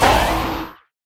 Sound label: powerups ring boost; spider-man-mysterio-rush-game